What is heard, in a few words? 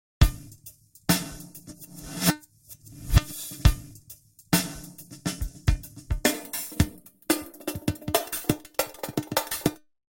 drummy test